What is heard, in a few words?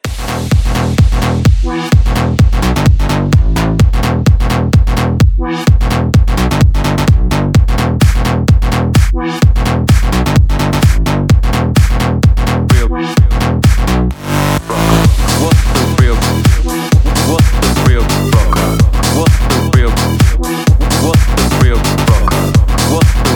remix